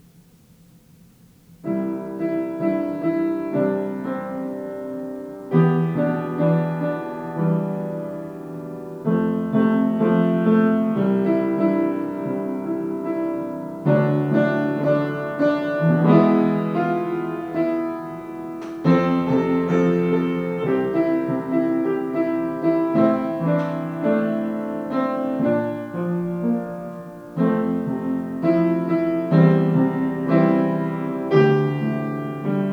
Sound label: Feeling Happy (Instrumental)